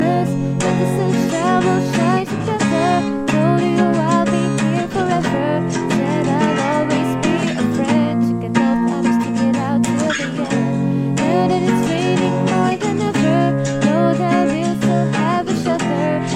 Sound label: Acoustic